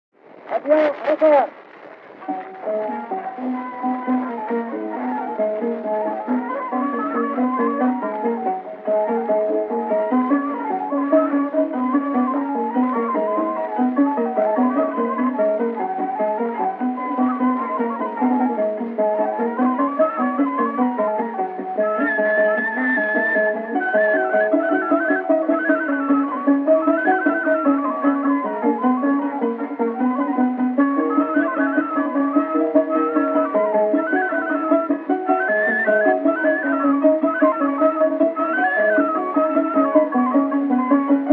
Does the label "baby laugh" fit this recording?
No